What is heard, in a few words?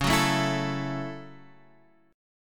F sharp Minor 7th C sharp